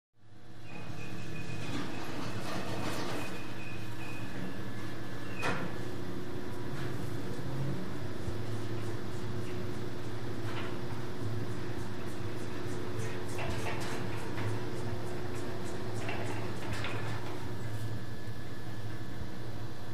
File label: Elevator First Open, elevators